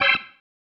Stab, Organ, One Shots

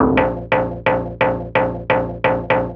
Stab Loops; neuro; Optiv & BTK

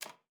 KLPB C#4, ALTOSAX; Xsample VOL-2

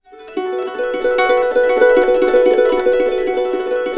Complete Harps, F#; USB Soundscan vol.62; AKAI

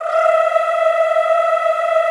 E4, Extended Classical Choir; Best Service

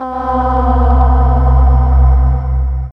C, Drum & Bass Pads

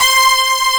POWER BRS C4

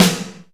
Reverb Kick Snare; Percussion; Dance Synths

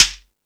Percussion

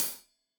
Hat Tight, Cymbals Stereo Hi-Hat; Hat Tight 1; Deeply Sampled Drums

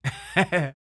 LAUGH, Jazz FX; Spectrasonics Vocal Planet

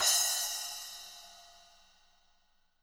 SPLSH, SPLASHES

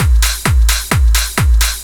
straight, Techno Trance Essentials drum loops; Ueberschall

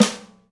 Folk Snare; Snares

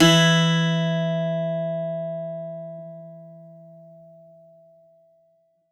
bouzouki

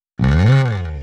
fill bass swee

